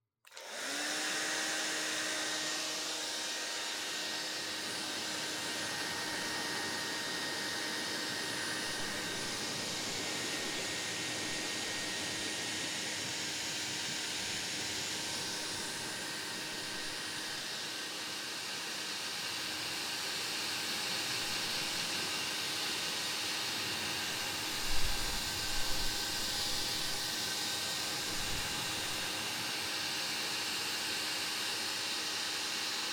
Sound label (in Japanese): 話しかけながら ドライヤー で 髪の毛 乾かし (左右), ASMR